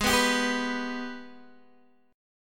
G sharp Minor Major 7th G sharp down Guitar DADGAD